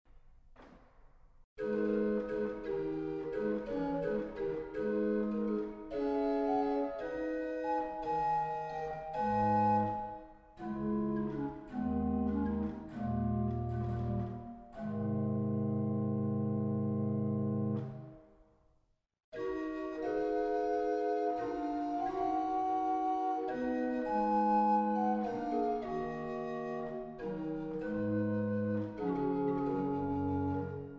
Gedackt 8; waltershausen

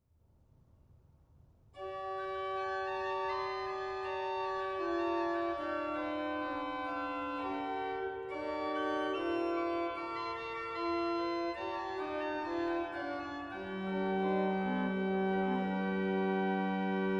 Octave 4, organ; dortmund; Sauer

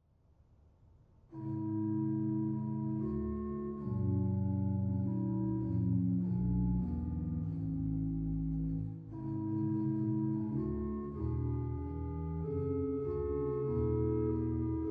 Bourdon 16, organ; dortmund; Sauer

